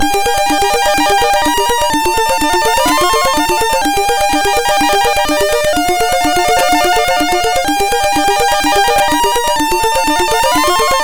Slow Arpeggios, MOS6581R2

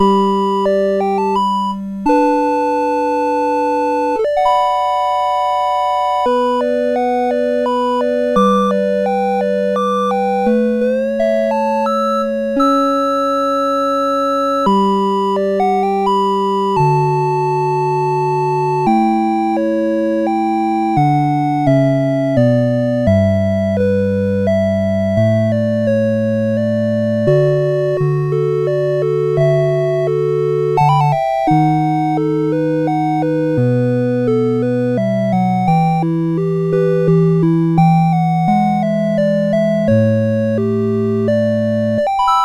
Dee, CSG8580R5

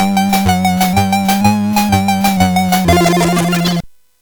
Quartet, CSG8580R5

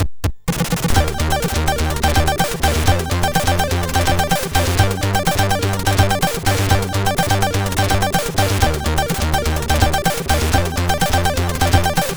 Intro, MOS6581; MOS6581R3

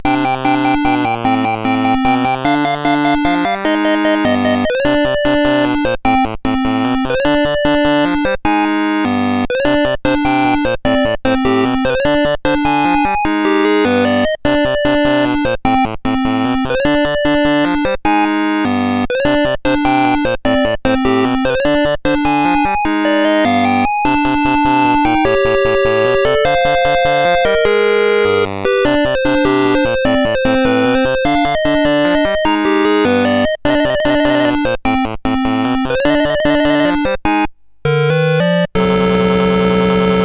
sid CSG8580R5

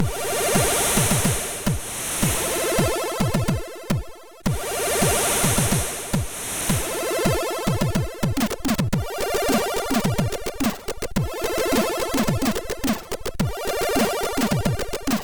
Shuffle, MOS6581R3; hvsc

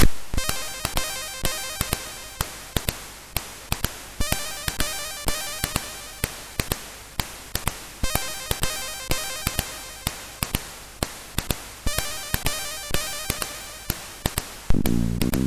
Juba Jazz, sid MOS6581R4

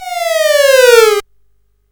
Dark Castle, CSG8580R5

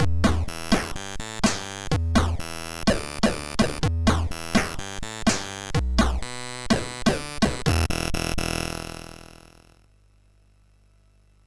MOS6581; MOS6581R3

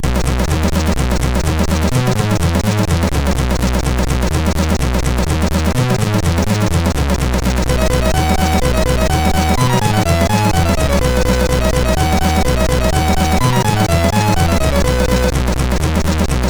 Voice, MOS6581R3 sid

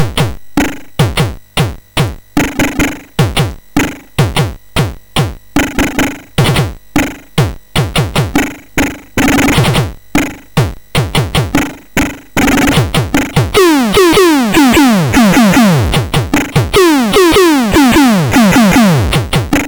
Drum demo MOS6581R3; hvsc; Non JCH Newplayer

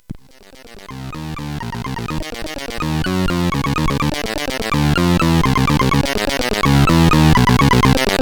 Test, CSG8580R5; hvsc

